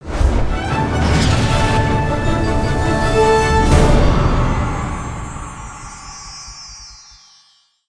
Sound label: Celebration; Venture; aronwk collection; ndaudio